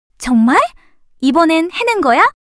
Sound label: surprise